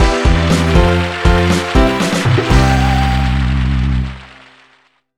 Jingle Level Ok

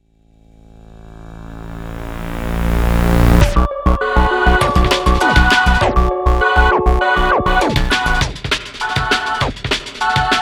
verse2 8beatintro 100bpm

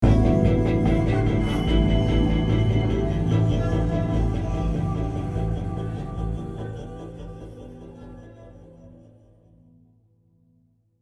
Demons (Stinger)

